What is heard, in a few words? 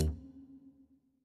Loop Tail; Good Job